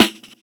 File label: Snr; Roland MV8800